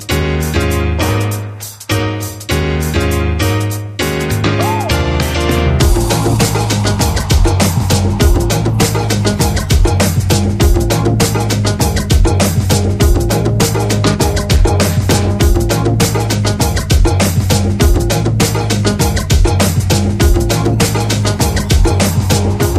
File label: Down Tempo Piano